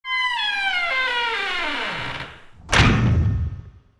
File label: boss door closed